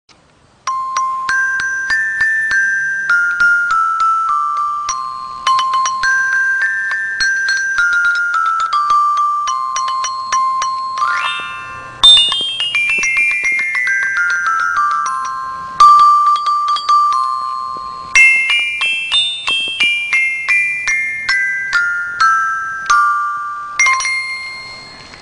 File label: carrillon soprano